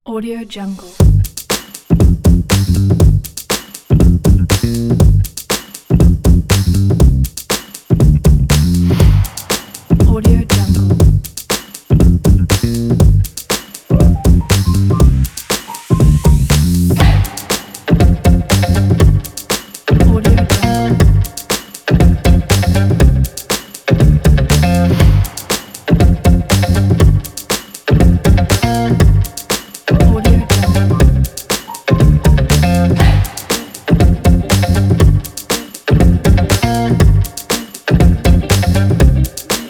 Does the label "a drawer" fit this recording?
No